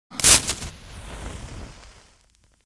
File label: Match Strike & Ignite, Household